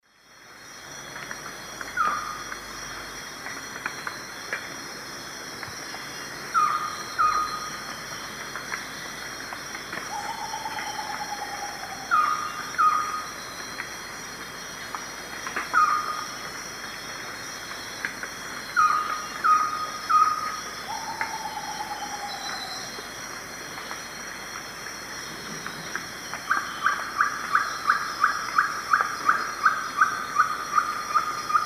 Glaucidium brasilianum, calls and song; S. America; Eastern Lowlands

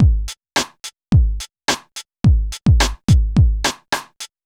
r and b

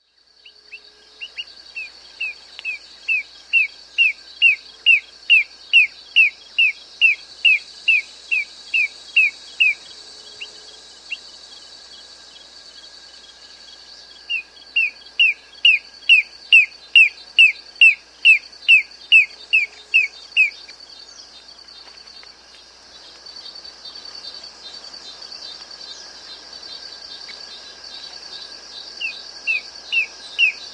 Narrow-Billed Antwren, S. America

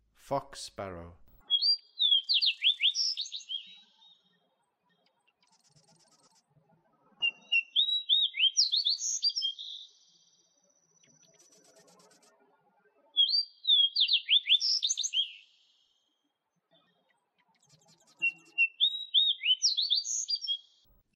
Fox Sparrow; North America

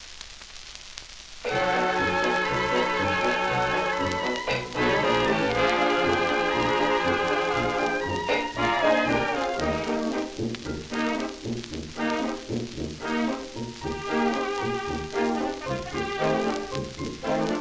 Orchestra

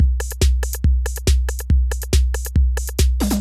techno 140

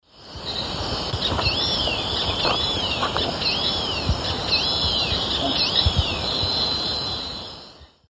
Cissopis leverianus, song; S. America; Eastern Lowlands

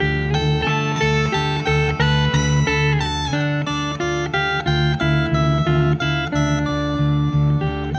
Shift Delay, Guitars; Rock

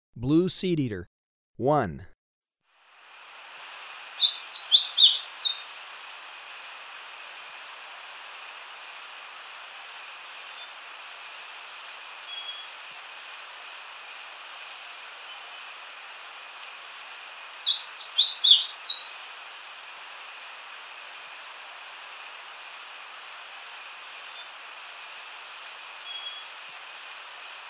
Blue Seedeater, Birds of Ecuador; S. America

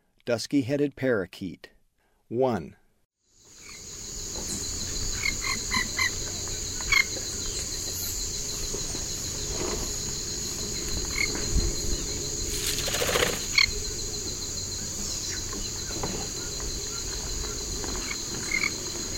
Dusky-headed Parakeet; S. America; Eastern Lowlands

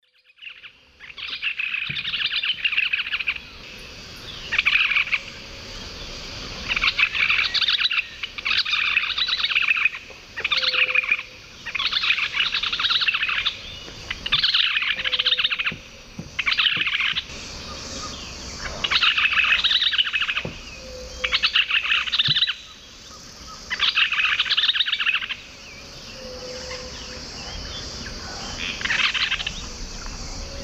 Monasa flavirostris, group calls; S. America; Eastern Lowlands

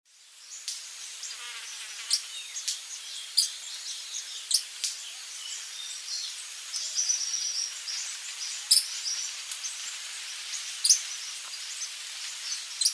Todirostrum maculatum, calls and bill snaps; S. America; Eastern Lowlands

